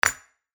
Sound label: Wood Block UI